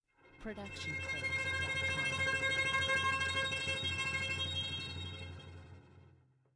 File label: Cello Sul Pont Tremolo; SoundsCrate